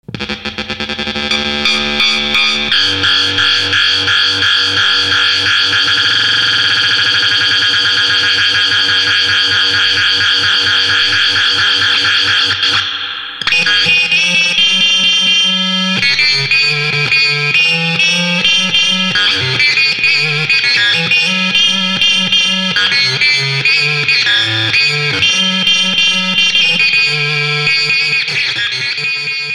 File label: GUITAR SYNTHESIZER RACK